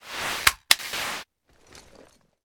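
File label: Weapon grenade machine gun jam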